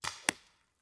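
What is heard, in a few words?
Paintball Gun Impact; Sound Ideas; Guns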